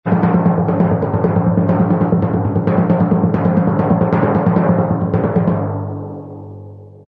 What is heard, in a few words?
timpani drum rhythm fast